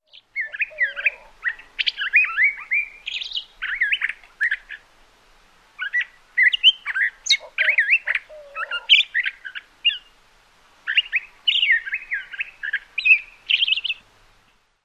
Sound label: song